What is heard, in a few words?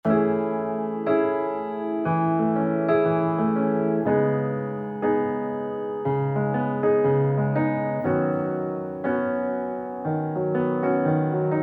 Chill Piano